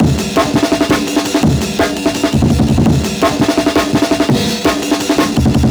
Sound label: 168 Trills Break, Loops; m8 breaks